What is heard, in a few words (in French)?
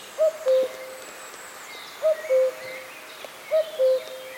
coucou